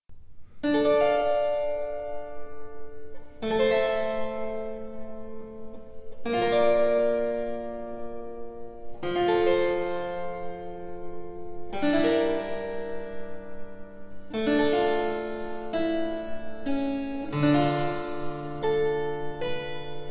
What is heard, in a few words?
Passaglia in D minor